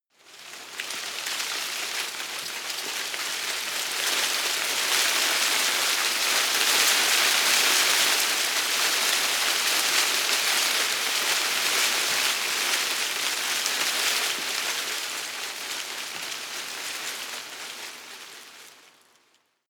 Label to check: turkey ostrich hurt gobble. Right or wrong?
Wrong